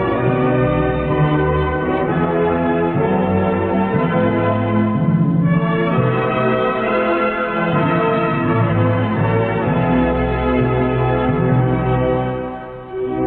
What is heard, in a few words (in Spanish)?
Himno nacional de Groenlandia